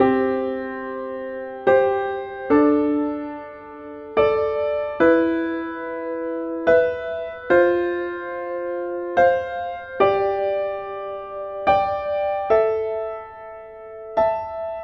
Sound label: basic fifths